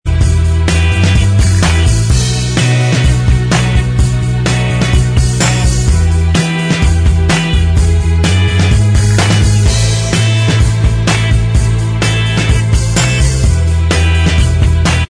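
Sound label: instrumental, backing tracks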